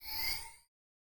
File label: Trash; Linux